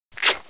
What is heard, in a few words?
head shield; svencoop; paranoia